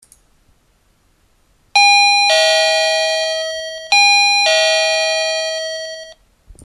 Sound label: Gong; Zvonki